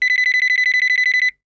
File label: Cell phone ringing